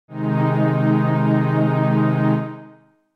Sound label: Eb Ab Major